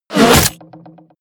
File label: ballista hit